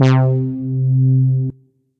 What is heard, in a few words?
Syn Brass; Synth Brass; Pochanuh SynBrass; Ableton; Factory Packs; Singularities